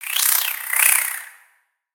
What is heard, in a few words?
Spider Idle Look; Lego; creatures mechanical